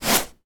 household paperback book slide across table

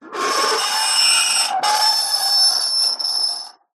metal scrape on metal med harsh shrill

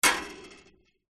impacts metal wire mesh hit with metal object

